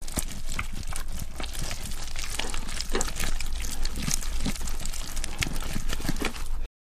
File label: Flesh Mangle, gore stabs